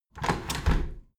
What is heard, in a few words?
window handle squeak turn close heavy; objekte; fenster